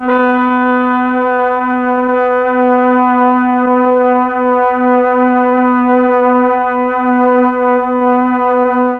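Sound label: B2 half speed brass, mellotron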